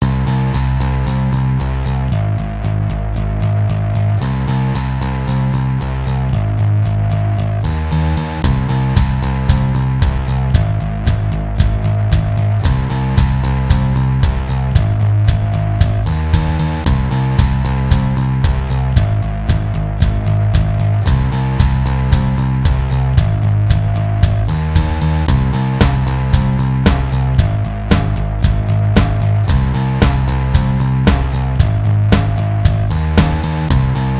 instrumental

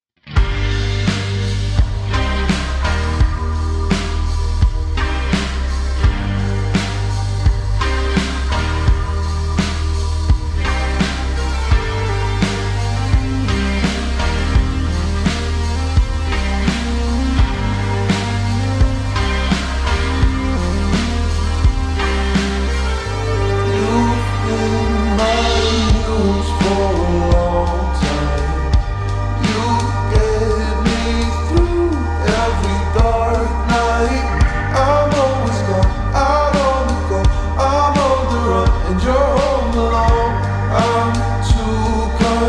slowed reverb

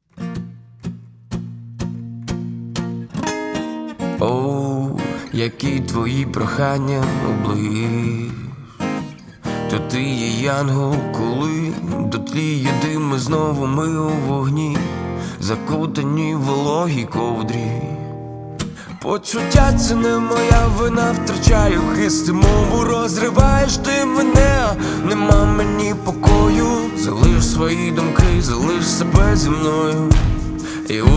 acoustic version

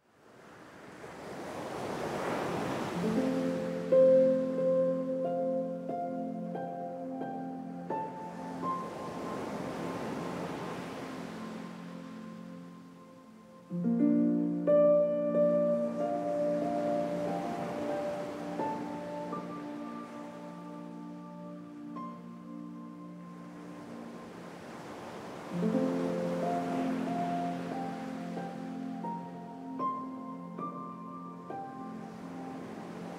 piano

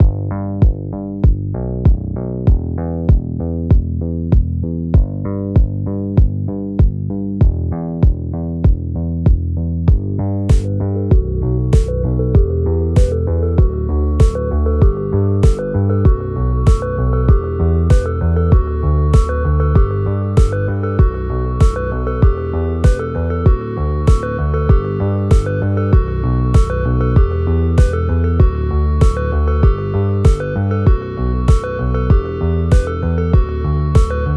instrumental slowed

